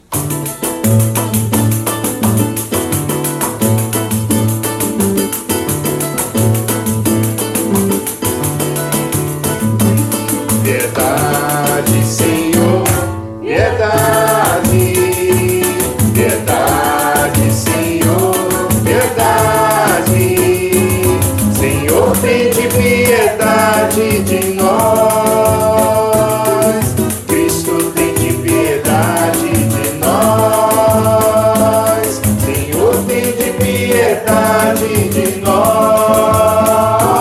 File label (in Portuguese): Ato penitencial Missa em samba